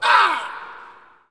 Hockey Male Hurt; SFX Kit; Hockey Vocal Sounds

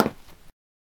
stone

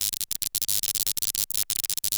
hum; firefight

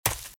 Footsteps - Leaves - Sprint - Left; RadwinIsland

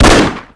Gun Pistol Shot; SFX Kit; Weapons

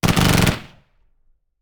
Machine Gun; Sound Ideas; Guns

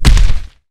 Creature Footstep, Horror Effects

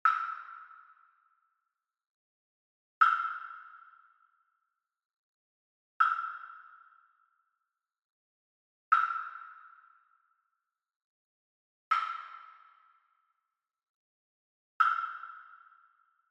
Wood Percussion Wood Block Standard Hit Far; boom library; Cinematic Strikes Construction Kit

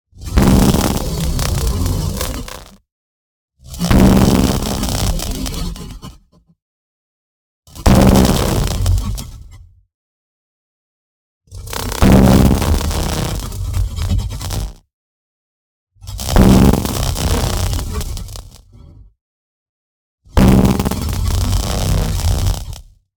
NEON ZAP Electric Spikes; Orchestra; boom library

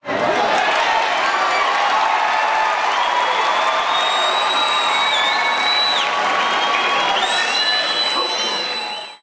Baseball Crowd Cheer; SFX Kit; Crowds

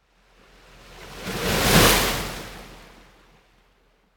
Ocean Strong Wave On The Coast Surf Water Lap; Hollywood Edge; sound designer's toolkit v2

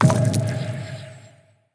Earth Spell Cancel; AudioWizards

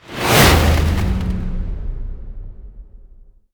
Ice Spell Mobility Teleportation Glacial Glide; Mobility & Teleportations; Arctic Aura

